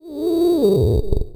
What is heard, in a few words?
Vocalish, 8-Bit; Games And 8-Bit Kit Volume2; atomsplitteraudio; chiptune